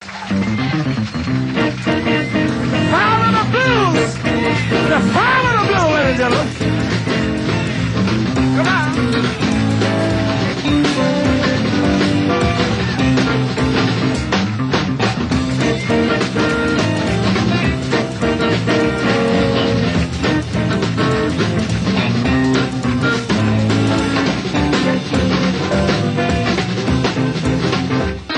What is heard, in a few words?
Instrumental Outro